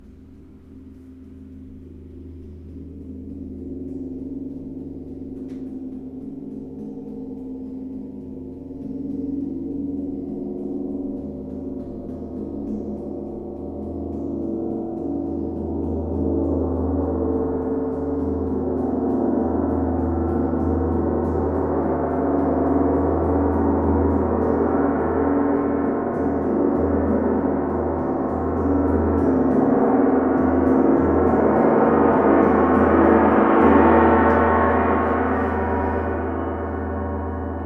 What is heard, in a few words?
Gong